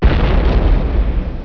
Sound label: BOMB, 爆炸系列声音素材